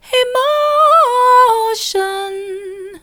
emotion soft, vocal

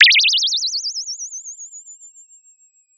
Sound of a bird tweeting nicely

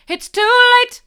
it's too late hard, vocal

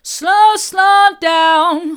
slow slow down hard, vocal

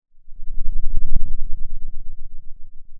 Sound of a thunderstorm, center

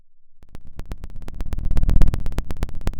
pop, Sound of a fire crackling

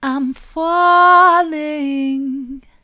i'm falling soft, vocal

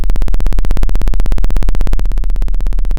Sound of a plane taking off

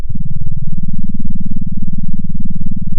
center, sound of a small motorcycle

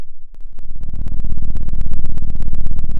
pop, Sound of an engine revving

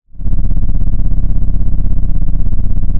Sound of an engine revving

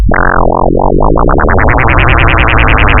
pop, Sound of a mud pump

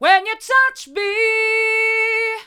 when you touch me hard, vocal

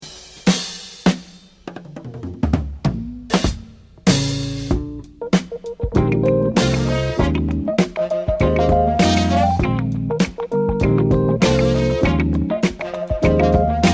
kicking pattern mono

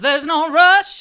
theres no rush hard, vocal

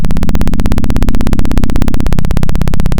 Sound of a plane taking off

